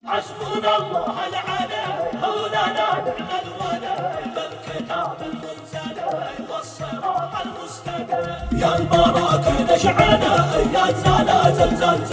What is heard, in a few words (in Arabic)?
زامل